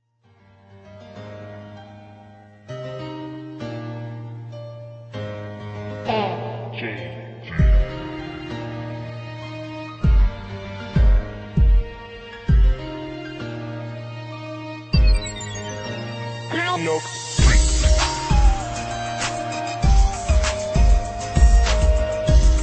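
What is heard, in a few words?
instrumental love beat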